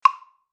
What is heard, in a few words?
wood block low